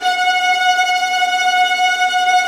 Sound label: VIOLINT G#, Synclavier Strings; ILIO